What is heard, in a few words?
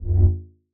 large space ship short rumble; soundscrate